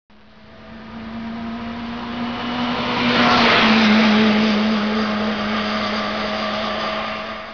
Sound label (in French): moto, doppler